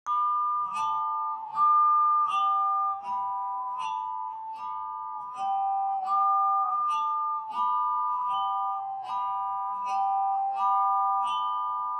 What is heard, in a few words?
ambient